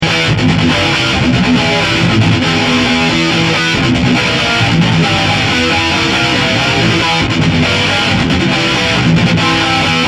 Metal Riffing

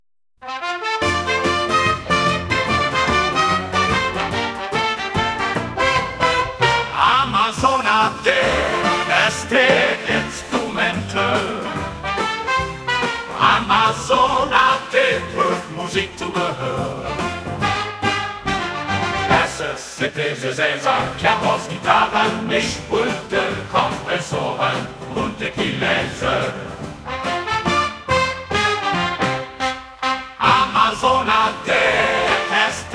Big Band

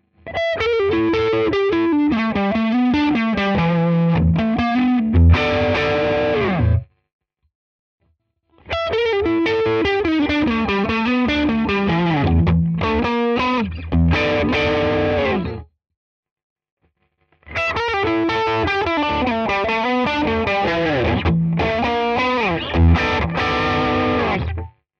TS Crunch Lick

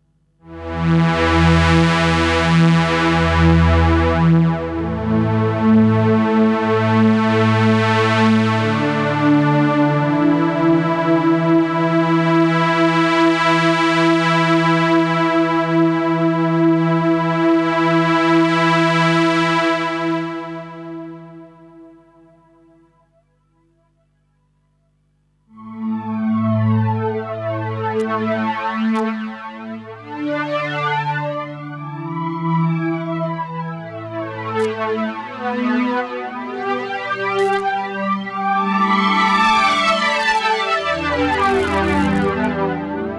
SE50 Flanger Strings

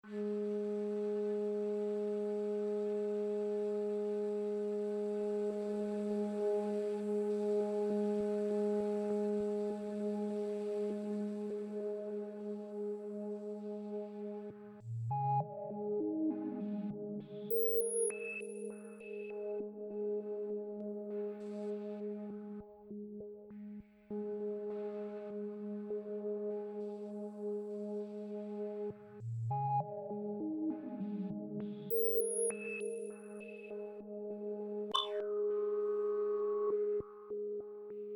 Flute Seq; MW Presets

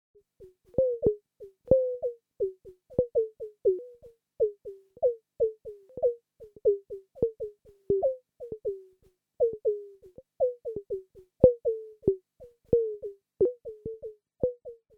Triple LFOs; Kobol; Serge

